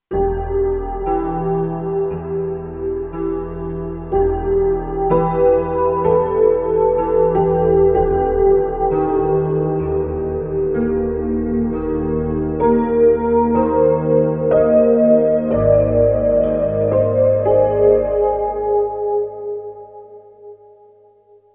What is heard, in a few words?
Grand, Kurzweil K2500X; Arrakis Grand; Factory Programs